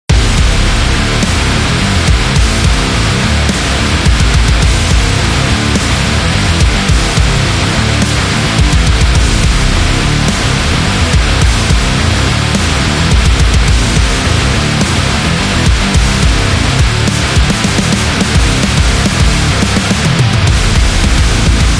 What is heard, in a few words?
Power Rock; gym rock